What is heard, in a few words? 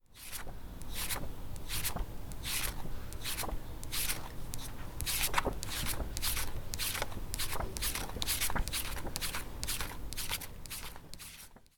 Flotante Photo Paper